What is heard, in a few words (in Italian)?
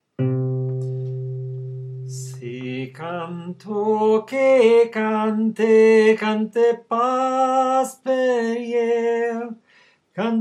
canto TENOR studio